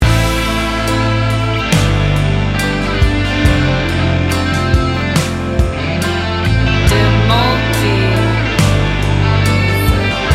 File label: instrumental ballad; Balada ini tidak sekadar musik, tapi seperti kanvas tempat emosi dituangkan.